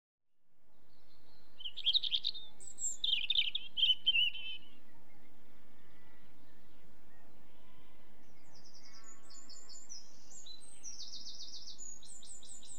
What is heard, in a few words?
Robin, With Distant Sheep; Birds; BBC Sound Effects Library